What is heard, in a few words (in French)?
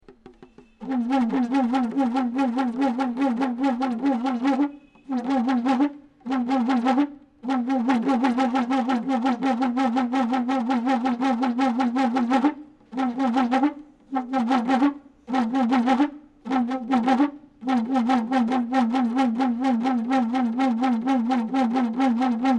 musicien